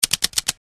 type

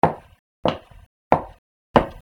fstep

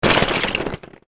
brake

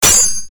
katana

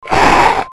growl

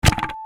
arrow

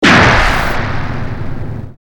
explo

